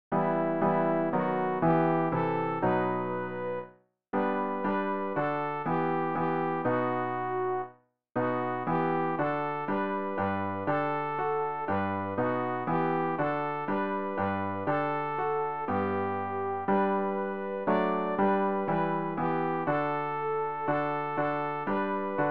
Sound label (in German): sopran; Ref. Gesangbuch; RG 674: Auf meinen lieben Gott